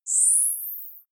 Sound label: zoom out, signale